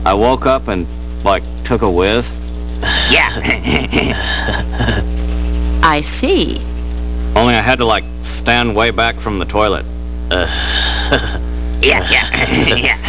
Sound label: whiz